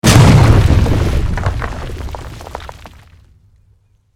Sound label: tnt